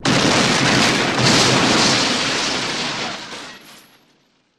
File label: Explo Debris